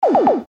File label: sms blaster